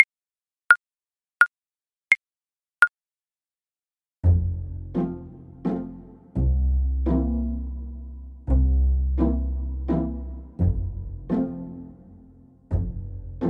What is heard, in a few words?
happy birthday, bassoon